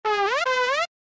fuzzy sound effect; yoshis island

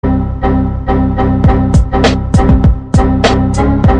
windows xp error

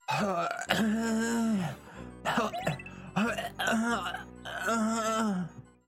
moan

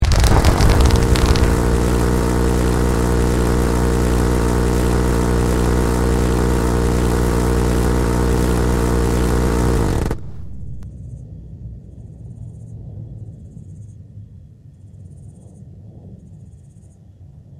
brrrt

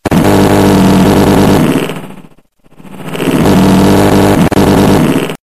rocket fire fart

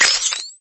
zoom in sound; lego star wars

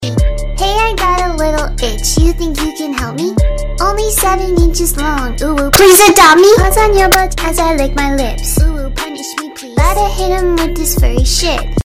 uwu; plz adopt me

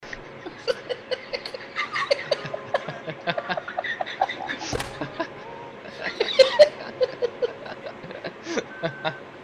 laughing cupcake; pepper

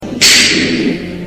wand

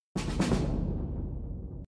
skill increase; skyrim

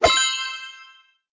crystal collected sound; angry birds space

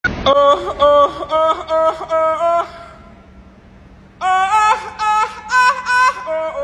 sad story; roblox

